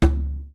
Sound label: big conga